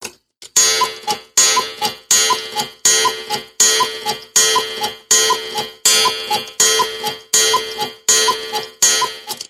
kuckucksuhr klingelton kuckucksruf sound

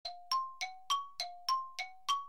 time passing, i phone klingeltoene

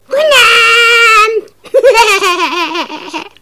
mainzelmaennchen lachen

